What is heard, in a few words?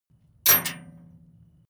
metal chain impact container